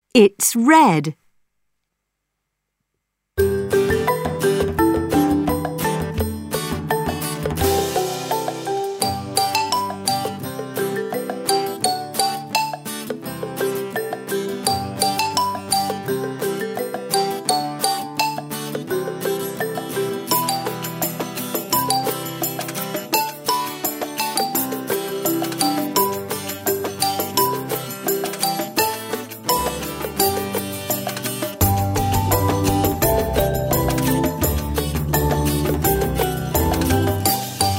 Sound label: chant